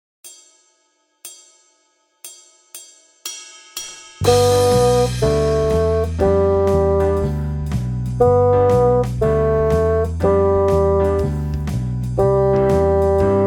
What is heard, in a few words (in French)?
basson